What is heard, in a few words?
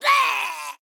Fungal Enchanter Death